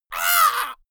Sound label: monster hit high; schreie